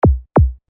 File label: Blurp Eight Notes